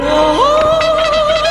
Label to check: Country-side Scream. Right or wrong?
Right